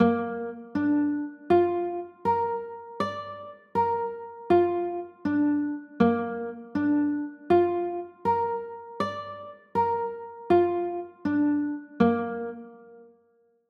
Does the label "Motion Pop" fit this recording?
No